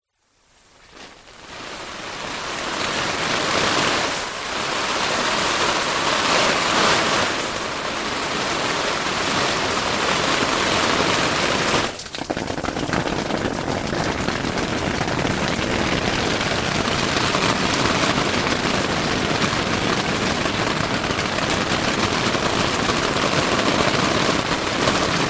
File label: tent water sprayed onto from hose internal recording; foley